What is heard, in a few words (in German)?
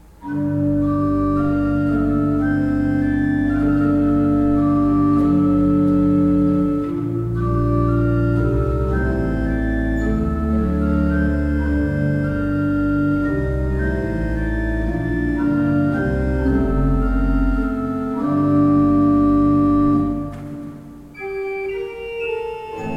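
Merkensteiner Orgelbau 19 II P, pfeifenorgeln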